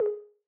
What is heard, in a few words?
Information Bar, sound scheme; Longhorn 7ista Sound Scheme by David Mounder